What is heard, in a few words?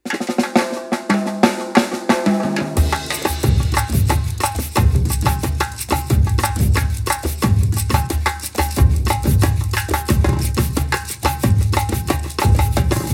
Brazucada Repinique